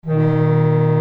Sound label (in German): schiffshorn